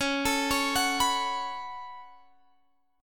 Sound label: Db 7th Suspended 4th Sharp 5th Db down; Db7sus4#5 arpeggiated